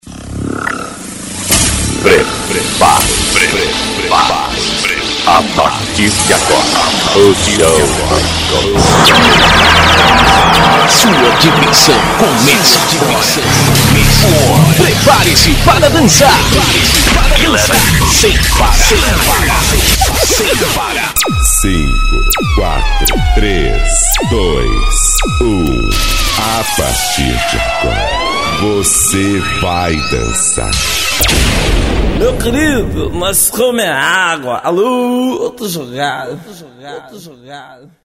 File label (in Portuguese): abertura